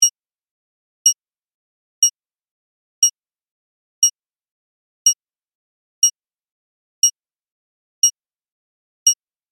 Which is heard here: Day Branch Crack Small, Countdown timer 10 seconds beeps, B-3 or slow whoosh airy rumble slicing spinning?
Countdown timer 10 seconds beeps